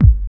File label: DR. LOOP, DRUMS; X-Static Goldmine 3